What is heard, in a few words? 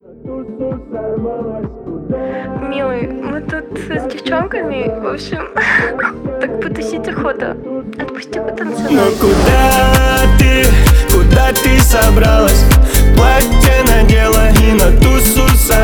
duet